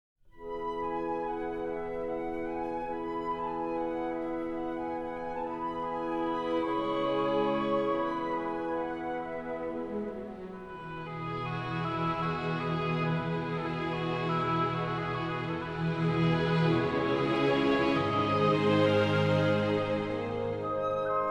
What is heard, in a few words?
orch; Greig-Peer Gynt / Suite